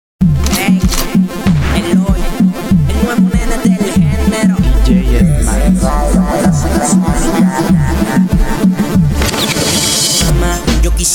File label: Reggaeton Version